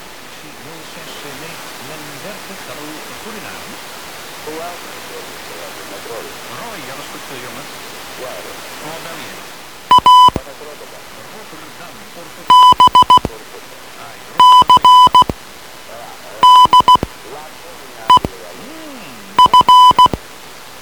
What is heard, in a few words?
Morsecode